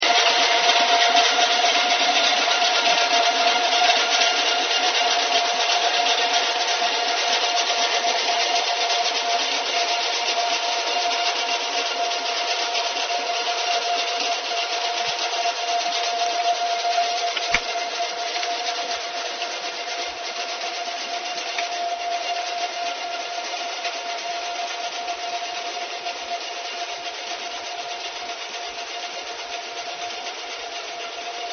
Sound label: vessel shrieking, ship